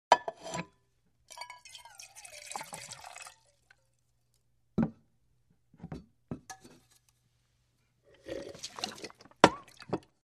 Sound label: Human Poor & Drink From Cup; Hollywood Edge Foley Sound Library